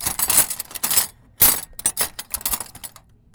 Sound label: Silverware; BB Retail Therapy Sample Pack